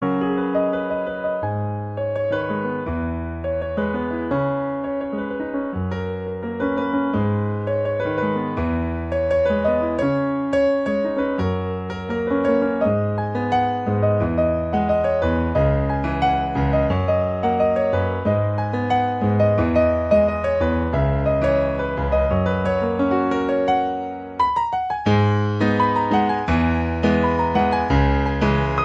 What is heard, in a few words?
piano; miraculous